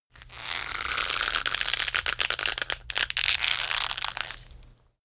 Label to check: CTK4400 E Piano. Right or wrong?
Wrong